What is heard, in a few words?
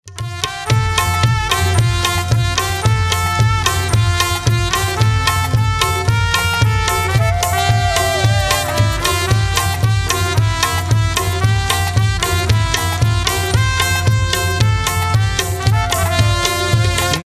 instrumental